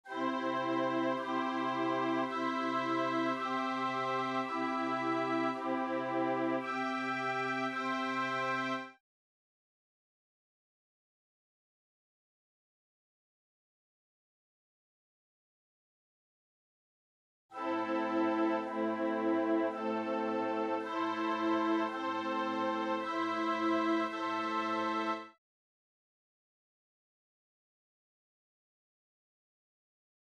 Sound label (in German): Harter Dreiklaug Weicher Dreiklaug Verminderter Dreikl; Harter Dreiklaug Stamm Accord